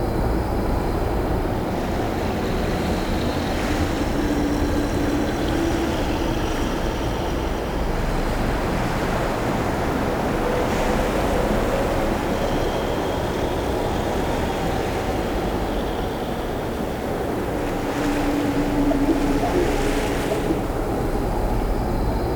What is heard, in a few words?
Corrupted Beach Night Loop; Ambience